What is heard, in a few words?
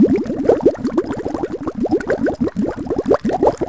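Bubble; Finding Nemo; XBOX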